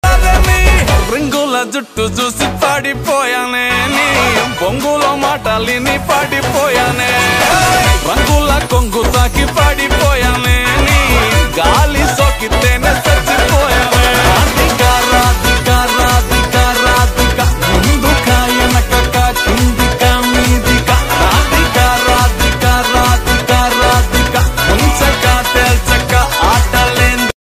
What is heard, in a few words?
song music mobile ringtone music